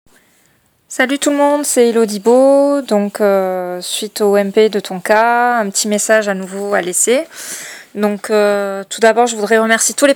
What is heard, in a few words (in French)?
vocal